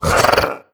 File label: shake off; creatures; vox